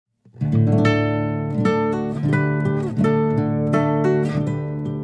Instrumental

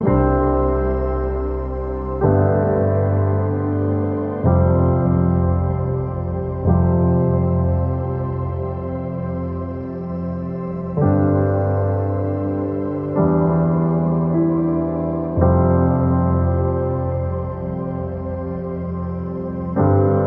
Instrumental

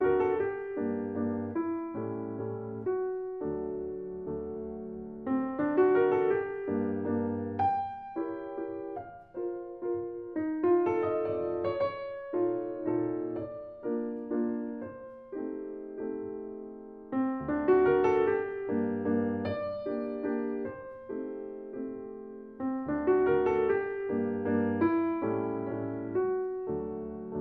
Ballad G-Moll; Frederic Chopin

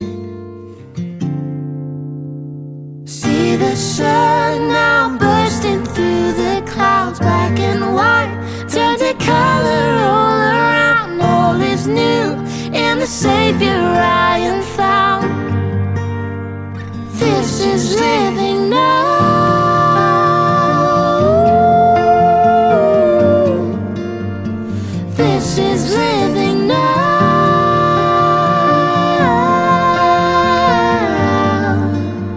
Acoustic

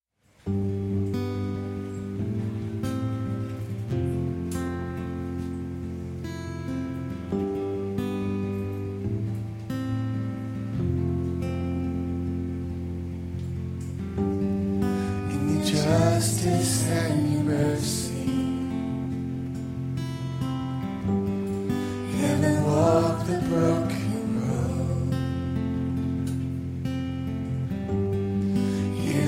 acoustic version

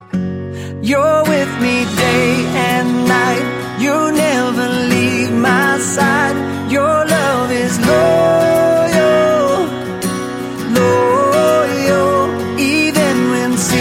Acoustic

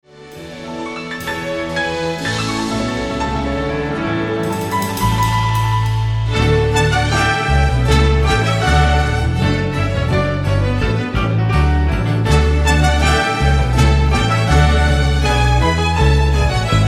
Bach meets Jazz Techno

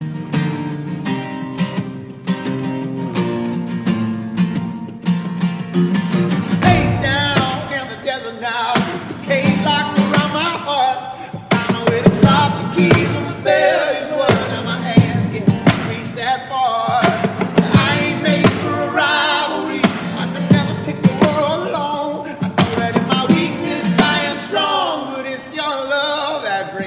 Acoustic Live

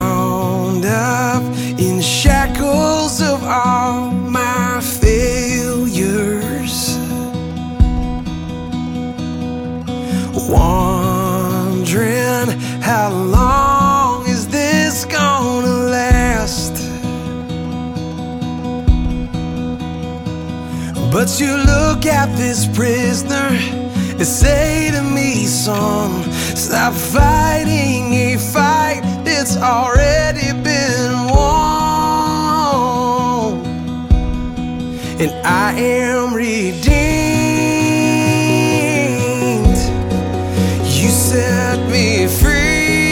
Acoustic Edition

